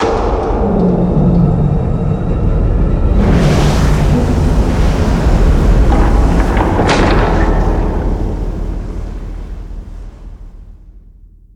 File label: Ocean Gate Up